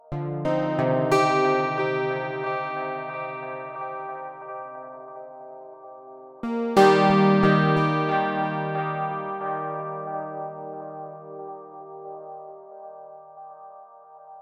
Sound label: Guitar